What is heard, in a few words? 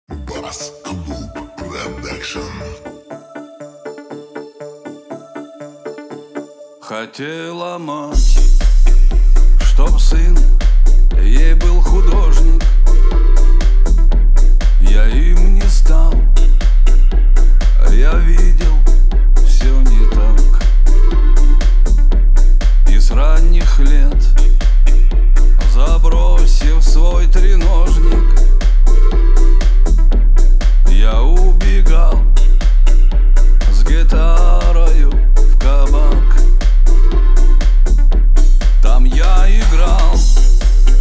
31-33-35 Hz Low Bass